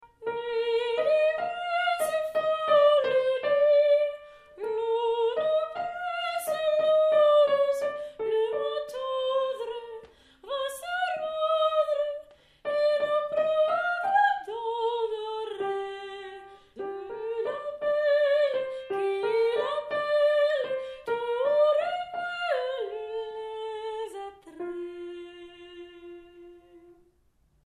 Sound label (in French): l heure milieu sop